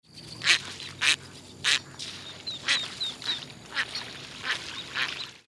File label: Voice Mottled Duck